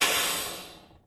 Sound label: brakes; rigs of rods